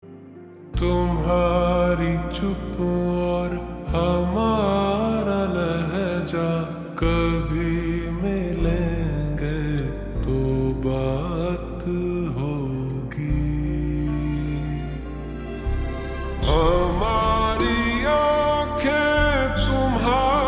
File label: Ringtone Slowed Reverb